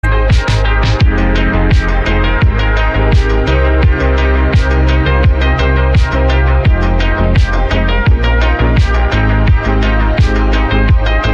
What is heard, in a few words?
Resonance